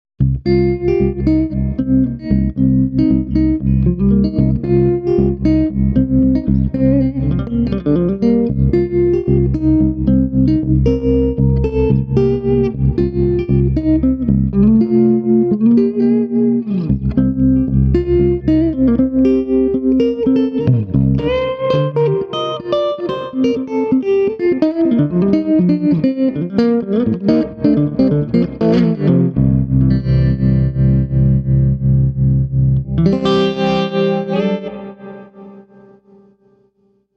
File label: Swart Amp